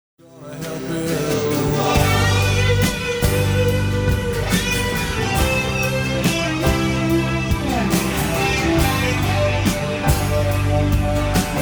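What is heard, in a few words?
Mother Solo, guitar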